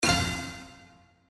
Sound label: reel spin stop special; slots; Outdoor America